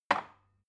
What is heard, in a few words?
glass put down cupboard hard